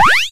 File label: letter